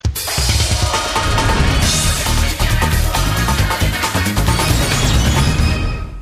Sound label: zone clear, Chiptune, Genesis; Sonic CD